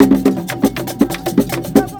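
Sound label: 120 COMBO, drum; Best Service Advanced Media Trax Modern Composer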